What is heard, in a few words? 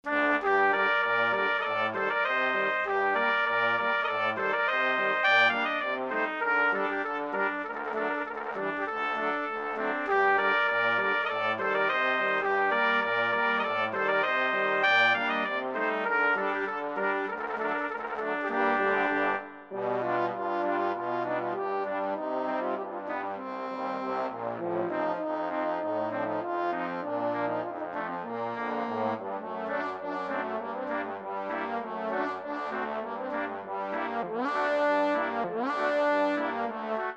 Brass Quintet; La Cinquantaine